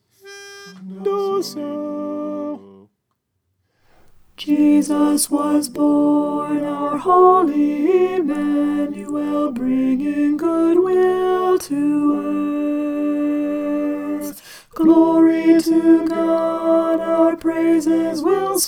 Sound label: alto